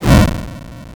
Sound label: fire attack